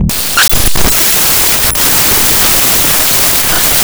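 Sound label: bass 125 D, organ loops, vocal house; PS-28 Vocal House Samples; instrumentloops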